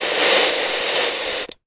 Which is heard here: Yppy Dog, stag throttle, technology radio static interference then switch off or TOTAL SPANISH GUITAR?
technology radio static interference then switch off